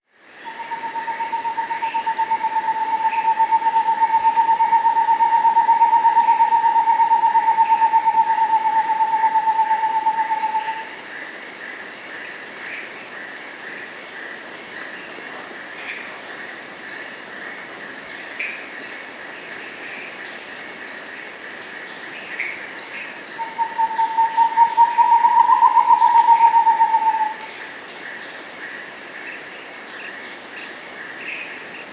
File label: Rufescent Screech-Owl (Otus Ingens); S. America